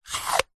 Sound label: container plastic display case small lid slide close